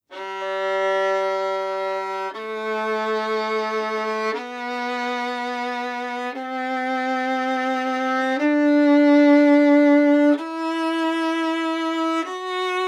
scale Modern Bow Modern

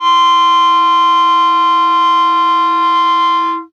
F sharp 4 G sharp 5 D 6 G 6, clarinet

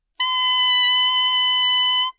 D7 alt, saxophone, tenor

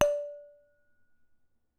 bellplate corner; bellplates

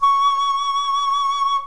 C sharp 6, flute; modern

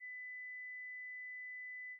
2kHz 45dB

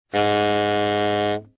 A sharp 3, saxophone tenor

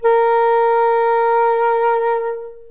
A sharp 4 long, flute; modern

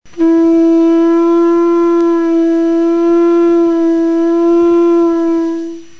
lipping, brass

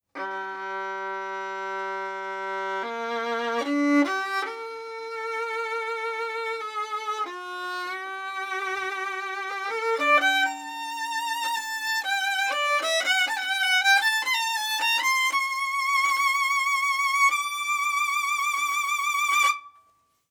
Baroque Bow Gut; Bruch

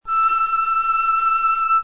E6 no split E, flute; modern

